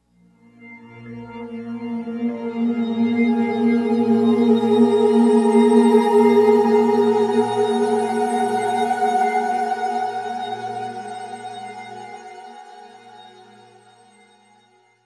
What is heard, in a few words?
Hi Complex Voice; Sonic Foundry; Sony Creative Software; James Johnson Spektral Minimalism